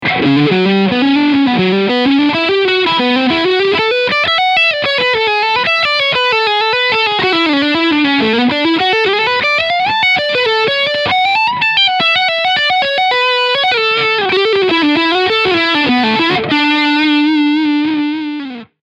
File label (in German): Leadsound Steg PU